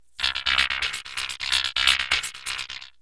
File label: SYNTH LOOP; E-MU Formula 4000 Series Vol. 2